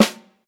phat acoustic snare sound a key, snare drum tuned to the A note